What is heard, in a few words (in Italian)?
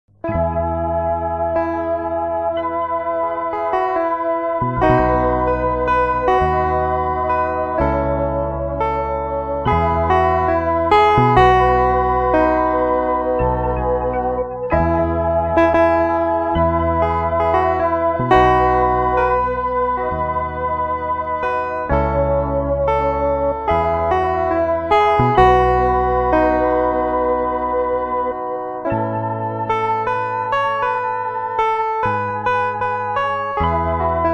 solo musica; canto plurilingue